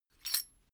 metal chain movement single

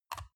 metal device click lock in soft; industrie-wirtschaft

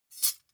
midsize metal thin clank drop

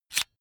electric drill template slide in fast; maschinen; bohrer; soundpool

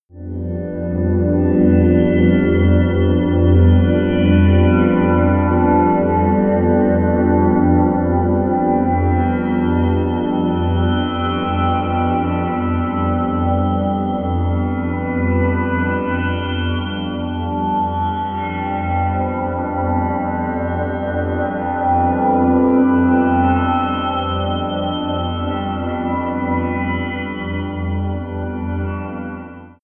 spiritual, music elements

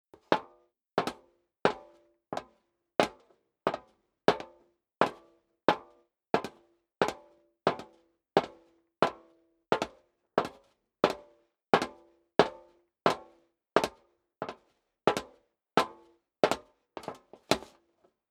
step high heels metal dry walk sequence; schritte

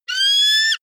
eagle call; natur tiere; voegel

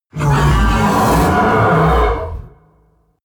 coloss roar loud deep; kreaturen

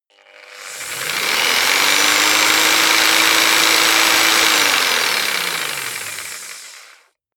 jigsaw engine medium slow long

